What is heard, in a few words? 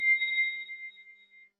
agent dispatch soft